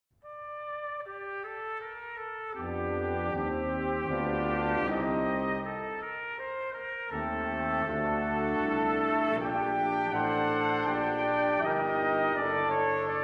Concerto in B Flat Major, Sicilienne, Brass Quintet; Atlantic Brass Quintet; A Musical Voyage